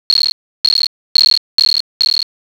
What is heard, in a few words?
insects